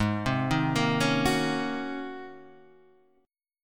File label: G sharp 11th G sharp, down, Guitar, Half Step Down